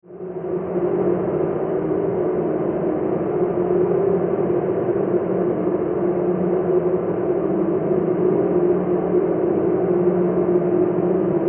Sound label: Science Fiction Planet Atmosphere Drone; Sci Fi Sound Effects; Sci-Fi (Sound Effects:Science Fiction); SFX Bible 6